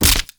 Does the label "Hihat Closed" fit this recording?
No